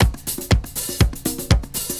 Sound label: 120 VINYL, DRUMS